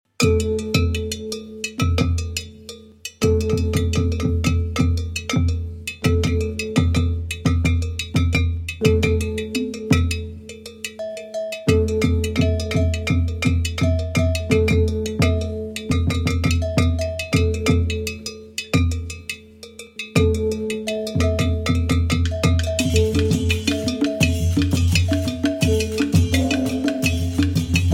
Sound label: Fengtong Drums of Manglai; The People’s Rhythm